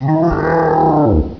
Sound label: alien, water